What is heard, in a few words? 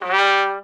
TRUM STAC G2, Trumpet Staccato; Orchestral; DirectWave; Varazdin Orchestral